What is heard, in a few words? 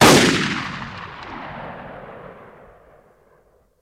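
Gun Shot Single Shot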